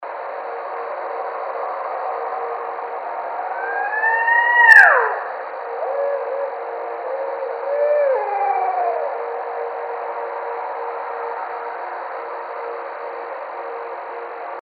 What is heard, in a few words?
Barred Owl